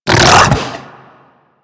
Infested Ancient Run Breathe; Warframe

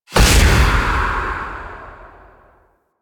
Reaper Soul Harvest Target Hit; Warframe; Wraith

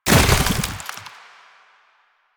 Infested Move Turn; Warframe

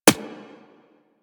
Bullet Impact Generic; Surface

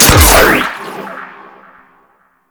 Harpoon Gun Charged Shot

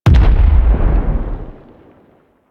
Golem Step Far; Warframe; GolemRemaster; Plains; Footsteps

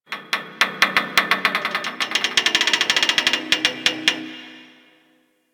Ocean Medium Metal Creaks; Warframe; Grineer Ocean

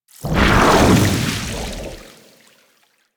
Tidal Wave Cast In Undertow; Warframe; Warframes; Pirate

